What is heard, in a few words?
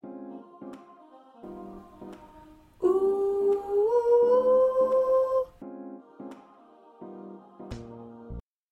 Backup Voice